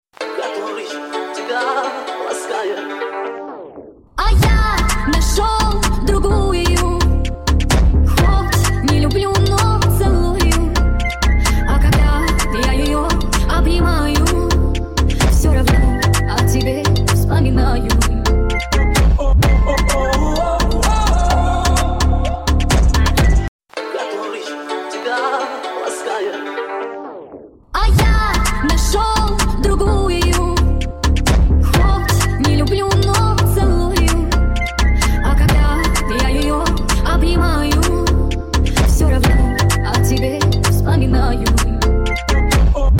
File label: phonk remix